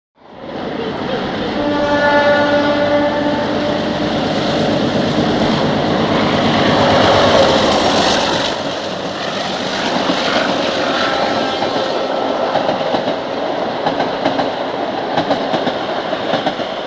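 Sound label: By Diesel Train Klaxon Horn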